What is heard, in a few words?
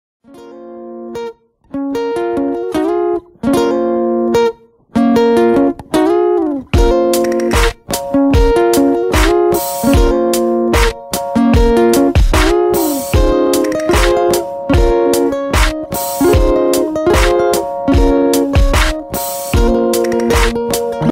ZTE Ringtone